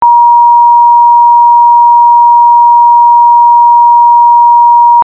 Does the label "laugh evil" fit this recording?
No